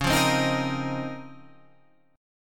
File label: Db Major 13th, Guitar Half Step Down